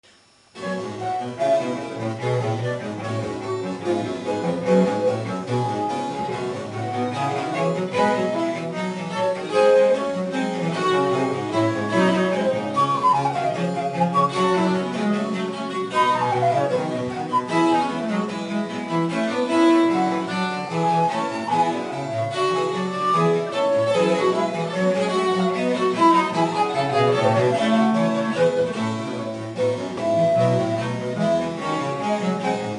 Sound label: G moll allegro assai; telemann